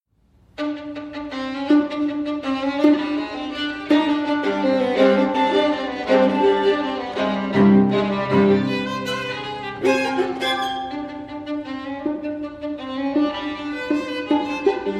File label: String Quartet Allegro assai